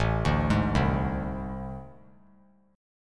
A Minor Major 7th Double Flat 5th, down; arpeggiated; JGuitar

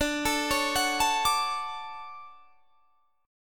D Major 7th, chord; DM7 arpeggiated

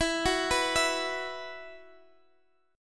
B Suspended 4th, E, chord

